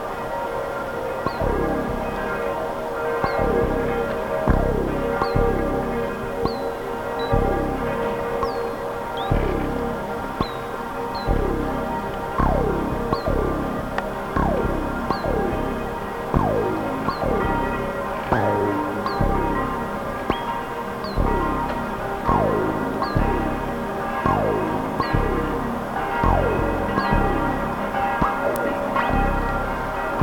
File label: frog city center